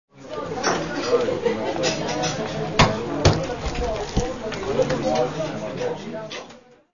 ambiance zing bar oeuf

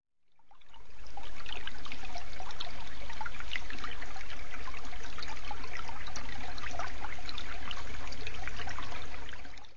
liquide ecoulement

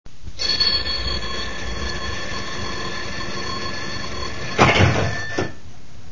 sonnerie dring blang 2

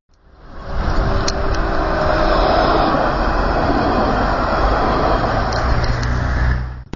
ambiance tram

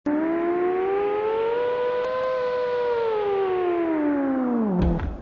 texture onde radio

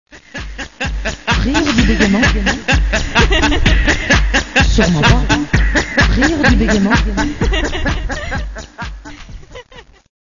jingle mix rire intro

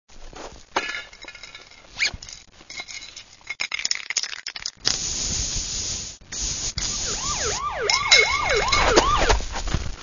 ambiance graph police

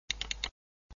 texture clic souris

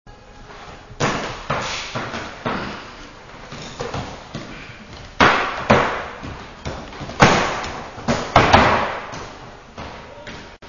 pas break 2

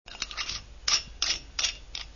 texture ouverture bouteille

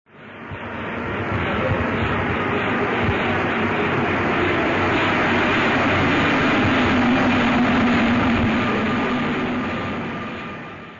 ambiance metro bis

